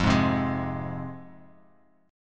F Minor F down Bass Standard; Fm strummed; JGuitar

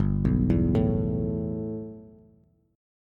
G 6th Add 9th G, Bass; G6add9 arpeggiated

